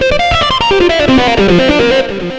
xtra lead

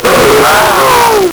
LSDj, Nintendo Gameboy; 300 Drum Machines

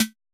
perc, Roland MC-909

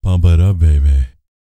Vocal Planet R&B Dance BASS CATCH; Spectrasonics Vocal Planet CD4

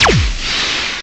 F X, PERC FX

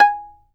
fiddle pizz G#5 L; Sampleheads; Whole Lotta Country 1 & 2

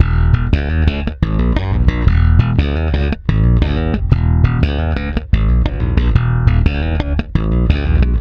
F, Bass; Spectrasonics; Bass Legends; 128 PWRSTRUM

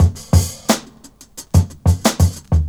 OLD SCHOOL, Breakbeats

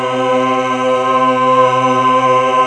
MELLO CHOIR

